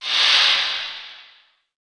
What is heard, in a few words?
Shake Wind; FREE SFX PACK VOL 2